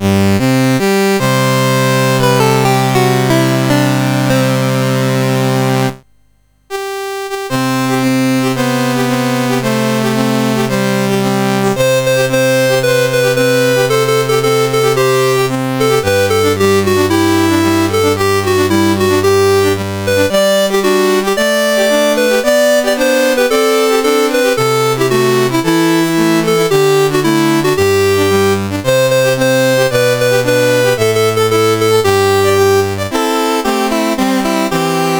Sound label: Senegal, National Anthems, CSG 8580; CSG8580R5